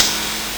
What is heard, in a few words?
LSDj KR-55, Nintendo Gameboy; 300 Drum Machines